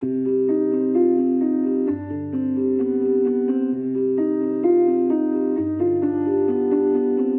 Piano Dark Hip Hop Cm 130; Sample Banks; Melody; Keyboards

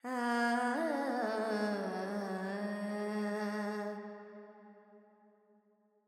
Verb Phrygian, Vocals, Carnatic Female, Alaps; 70 G; Sample Banks

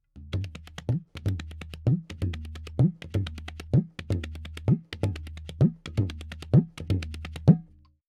Tabla C 4-4 128, Percussion; Sample Banks

